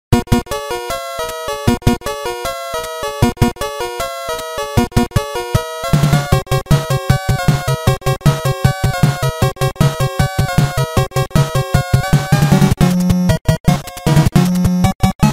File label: Contra Nes Funny Ringtone